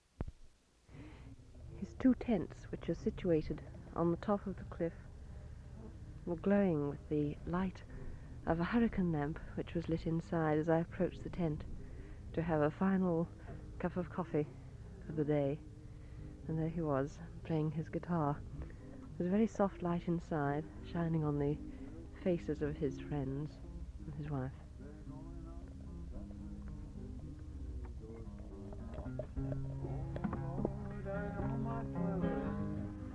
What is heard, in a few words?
guitar